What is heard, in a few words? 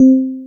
Soft Keyz, FM; Polyend